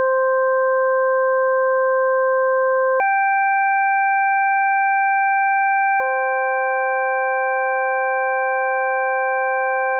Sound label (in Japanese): ド-ソ(純正律複合音); 実際に平均律と純正律の音の違いを体験してみましょう。; classical music